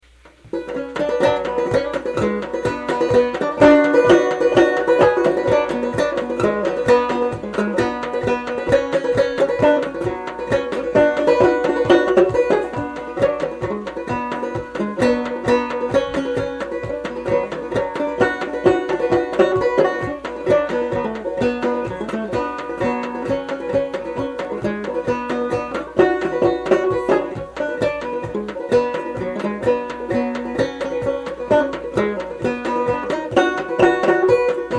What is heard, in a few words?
black eyed daisy banjo solo; bedford co VA